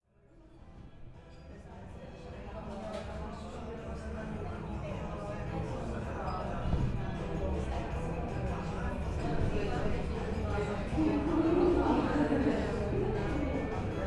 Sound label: Cafe Ambience, Prag field